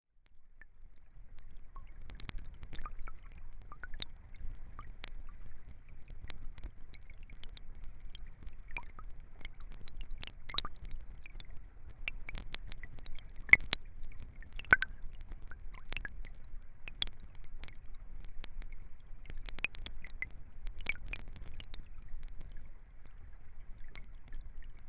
hillstream

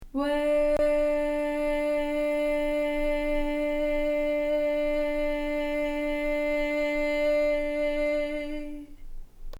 sung sound

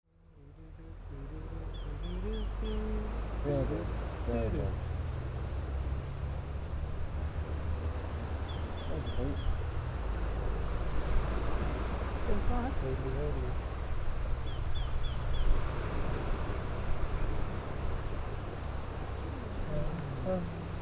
beach bounce

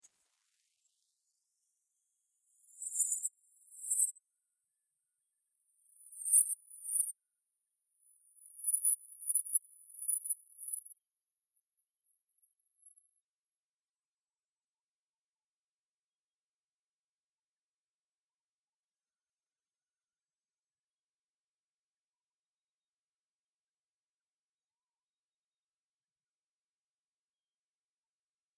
piano shifting up

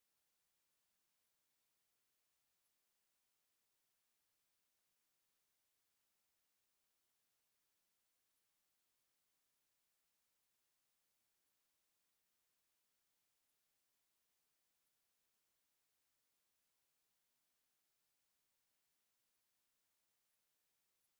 17kHz

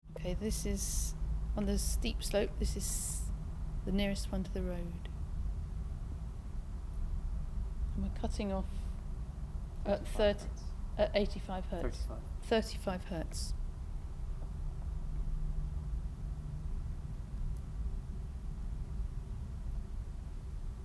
phased array angled down

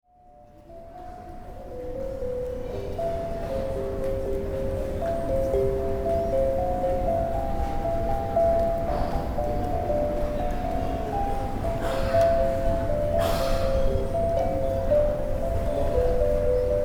trad music bounce

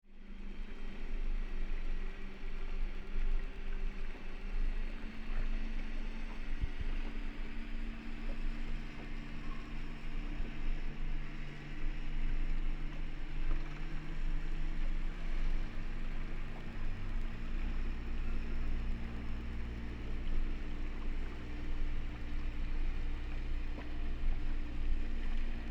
hydrophone

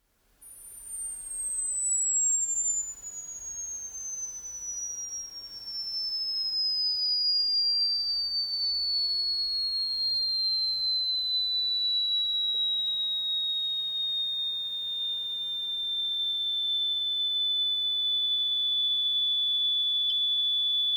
hi frequency

